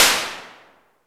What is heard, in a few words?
SLAP, Simmons SD-8 Drums